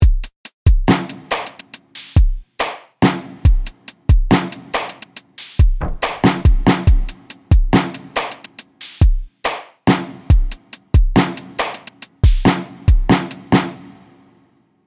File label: serum drums; chiqui audio